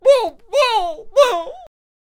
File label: Potionseller Death Vocals